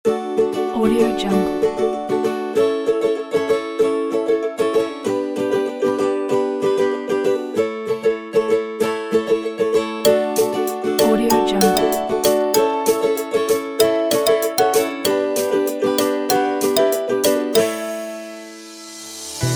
fun times ukulele

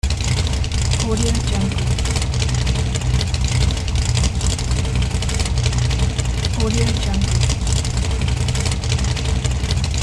hot rod engine loop

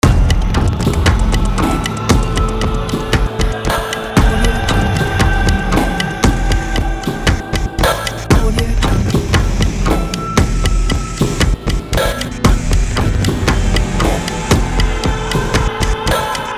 ancient game menu theme

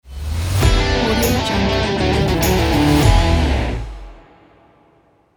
rock logo; abramsound

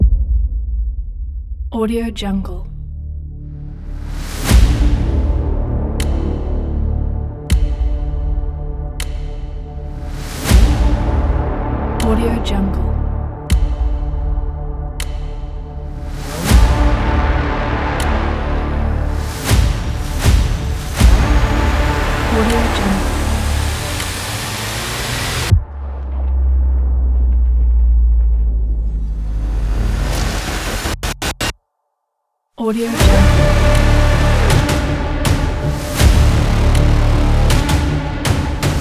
aggressive countdown trailer kit

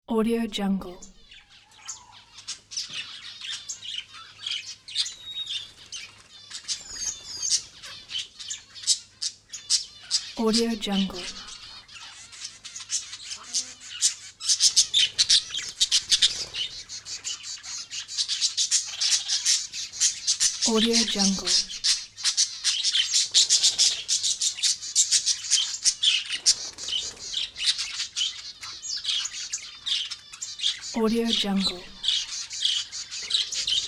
birds chirping

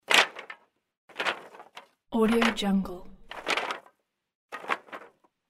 wooden planks dropped

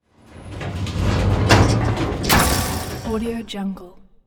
matter mayhem do metal street light fall; soundmorph

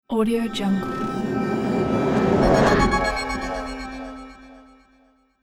flashback transition

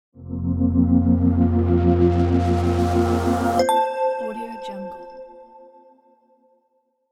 game logo

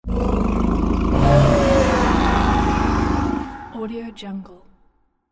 dinosaur gargle with high scream; jdgehlert

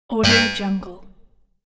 sf automaton robot

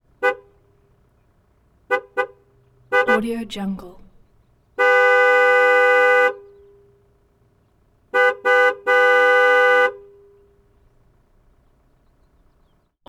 maserati spyder2004

